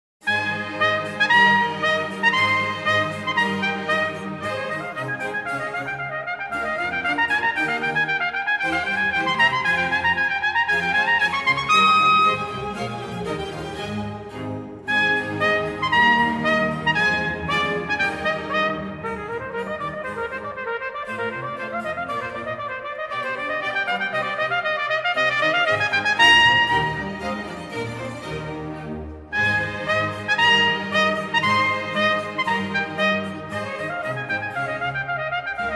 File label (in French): Concerto En La Bemol Majeur Allegro